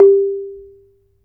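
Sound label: ranat thum G4; Spectrasonics - Heart of Asia CD2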